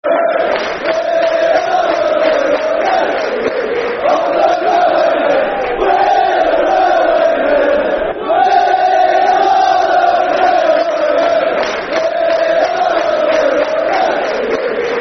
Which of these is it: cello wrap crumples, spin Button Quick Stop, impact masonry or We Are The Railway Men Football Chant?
We Are The Railway Men Football Chant